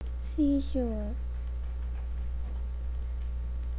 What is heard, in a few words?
seashore